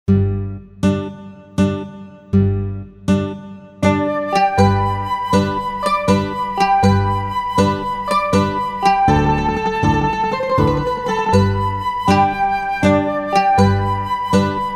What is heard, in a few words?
Mazurka; brani tradizionali